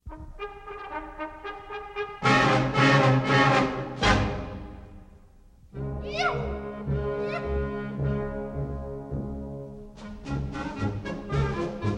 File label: Pipe Drum Corps And Military Band